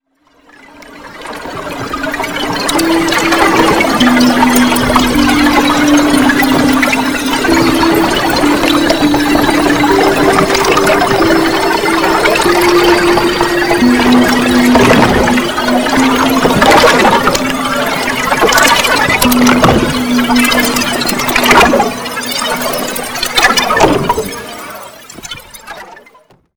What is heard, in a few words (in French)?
eau traitee; residence MDQ